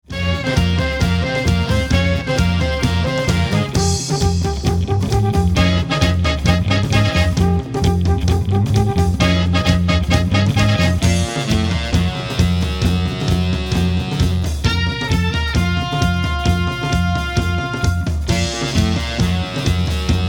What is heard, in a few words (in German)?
musik probe konzert; Shirin